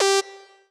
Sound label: trance lead